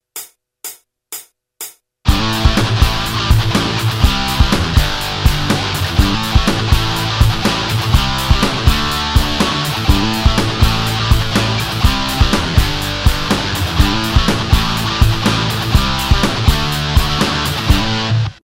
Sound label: lick jam, guitar